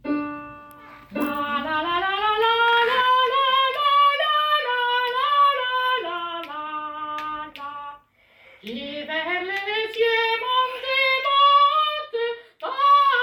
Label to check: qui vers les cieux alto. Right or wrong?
Right